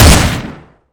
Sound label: pulse deagle, weapons; garrysmod